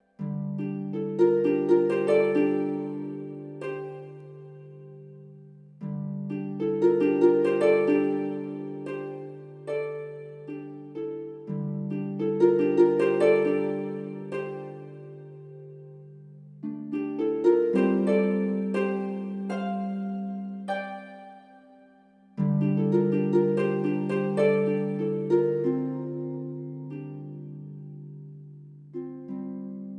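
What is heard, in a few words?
Jingle East Harp; rise of venice windows gamerip 2013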